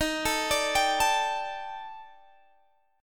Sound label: D sharp Major 7th Flat 5th, down, Guitar, Standard; arpeggiated; JGuitar